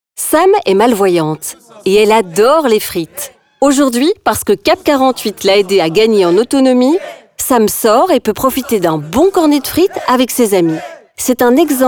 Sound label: Hey!; CAP48 Tous Ensemble